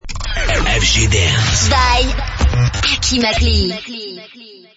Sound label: jingle; fgdeepdance